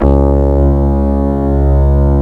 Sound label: C#, strings; Big Fish Audio